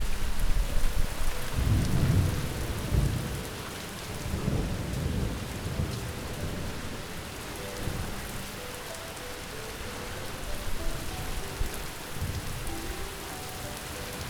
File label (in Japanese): 雨雷